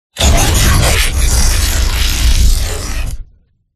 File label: HEAVYMECH; PMSFX